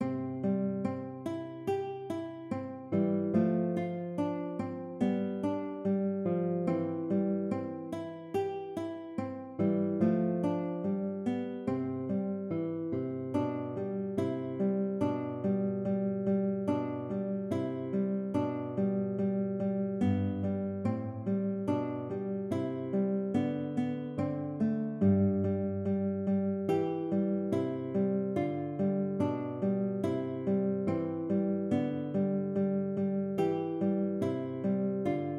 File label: Andante, konzertgitarre